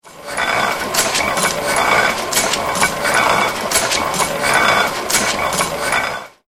Druckerei Original Heidelberger Tiegel

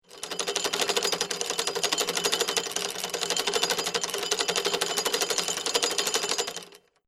Seilerei Kurbel

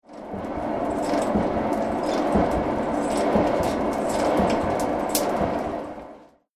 Schmiede Blasebalg Feuer kurz

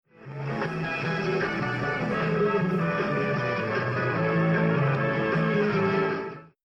Funktechnik Ausstellung Radio auf Kurzwelle

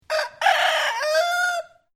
Hahnkrähen

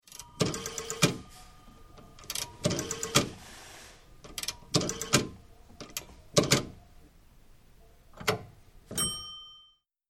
Wählscheibe lang; Posthaus

